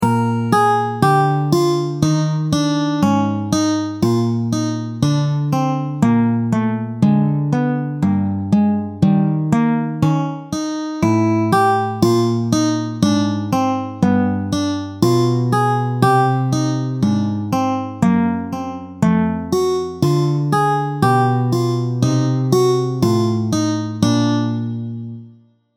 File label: Intervalic Blues in Bb